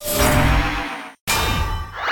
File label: In